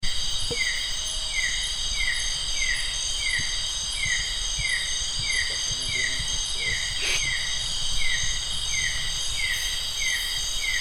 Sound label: Nightsounds Bukit Goram near Kapit